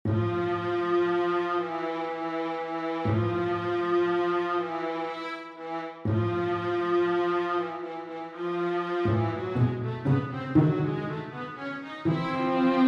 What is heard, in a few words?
String Quartet